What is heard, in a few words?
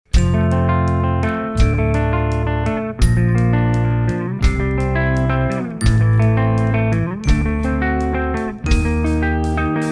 music sample